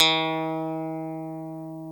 GTR STRAT, Clean E.Gtr, Brite Strat; Roland LCDP02 Guitar and Bass

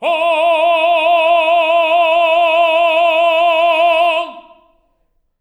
TENOR E4, Voices; Spectrasonics; Symphony of Voices

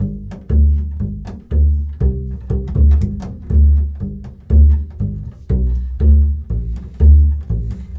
Bull Fiddle, Appalachian

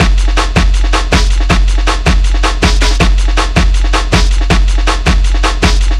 160, Drum Loops; Total Drum&Bass

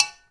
COWBELL; InVision Interactive; Percussion Slam

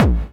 BD, Dance Mega Drums, BD HOU; Best Service

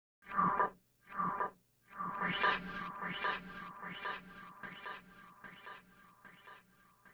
synth FX, Cmin; Big Fish Audio - Hardcore Breakbeat Action; Hardcore Breakbeat Action CD1; 10 Gotham 130 Cmin

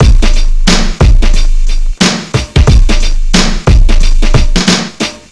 Street Tip, R&B Drums

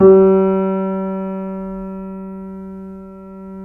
C7 PP, The Ultimate Piano Collection; East West

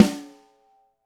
Snare, Roland TD-20

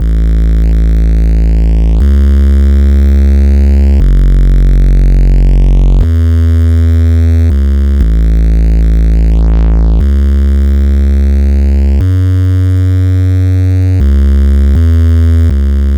Bass, EDM; Sonic Foundry (Sony Creative Software); Afterhours EDM; Chillout Service

